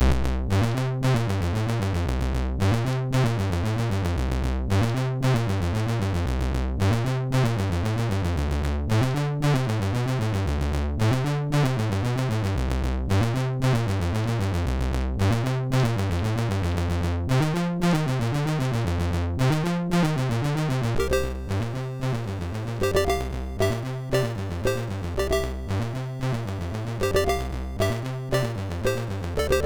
Tubular Bells; Tonid

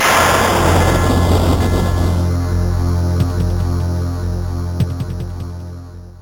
crash screen; Burning Rubber

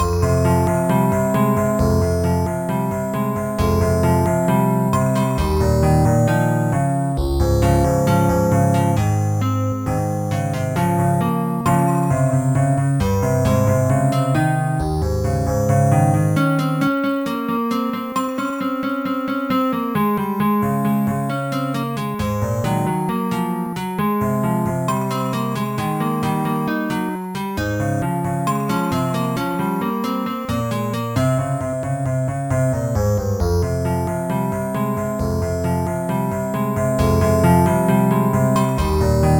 acoustica; SoundFX 1.3

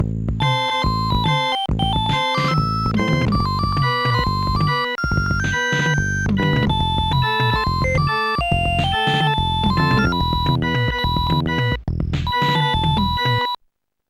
jamaican jam; Instant Music

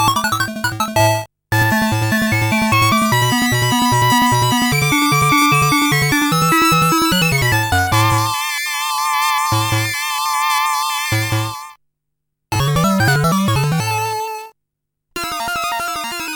internal jingles; FollinPlayer II; Ironlord; paramax

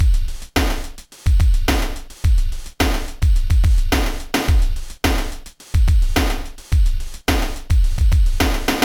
bacteria drums

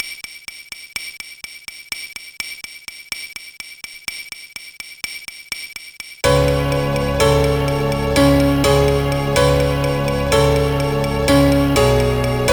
piano tune; MusicLine

